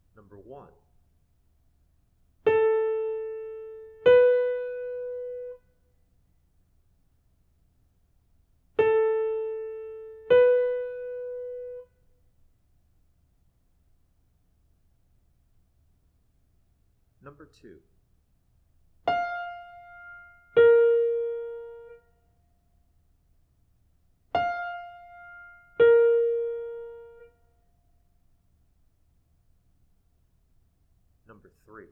intervals

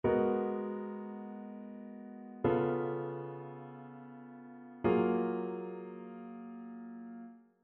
ii V I progressions; ear training

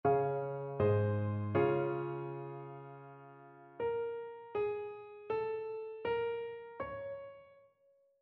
melodic fragments

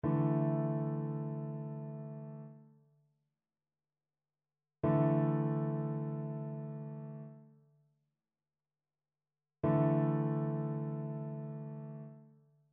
trichord; trichord identification

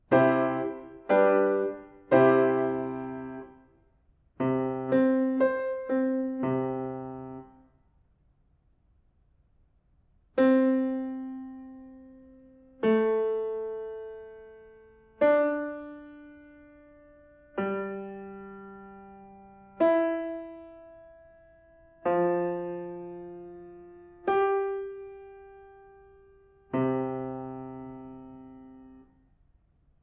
diatonic collections

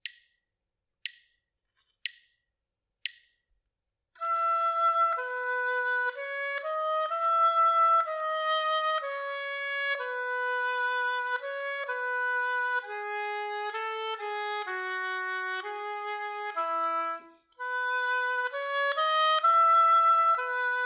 partially completed melodic dictation

